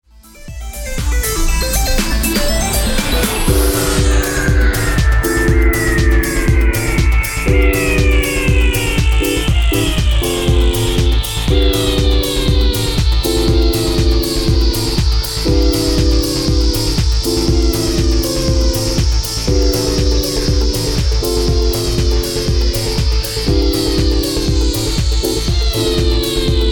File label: dot chords